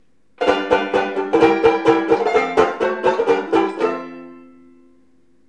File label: banjo; den A-sträng som brast